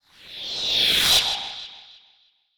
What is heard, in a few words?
magical synth wind static gust whoosh